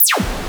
noise fx; Masterbits; Switch FX

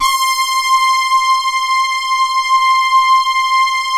OBERH, PADS; Vintage Voltage

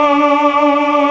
TRON MALES; E-MU Formula 4000 Series Vol. 3; Analog Odyssey; VK Instruments

